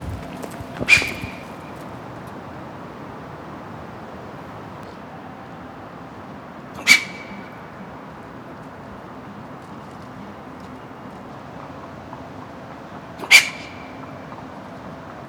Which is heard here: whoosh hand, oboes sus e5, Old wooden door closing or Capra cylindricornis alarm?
Capra cylindricornis alarm